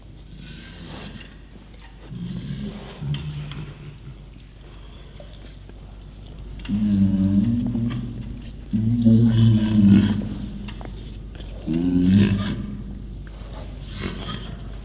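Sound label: lion amae, ライオン 甘え声; 上野動物園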